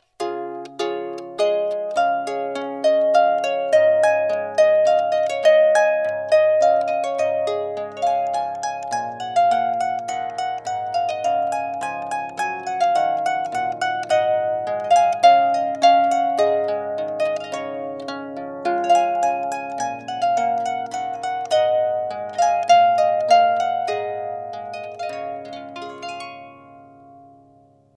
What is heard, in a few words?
water, harpist